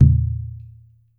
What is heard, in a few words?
NATIVE, ETHNIC DRUMS; Spectrasonics; Liquid Grooves 1 & 2